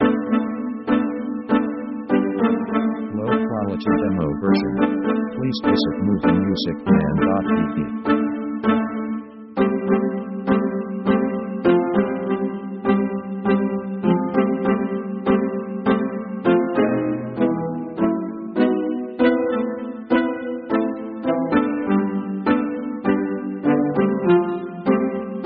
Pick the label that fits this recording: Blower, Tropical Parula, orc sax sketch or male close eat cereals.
orc sax sketch